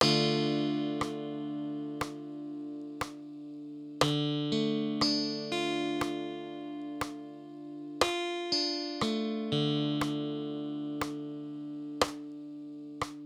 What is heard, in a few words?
Dm rhythm